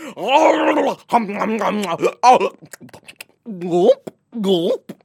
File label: nomming